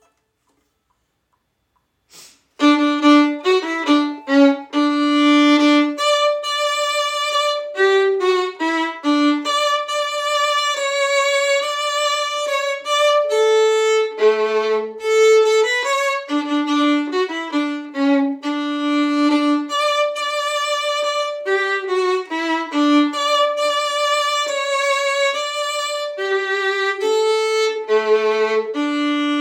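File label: Masterpiece violin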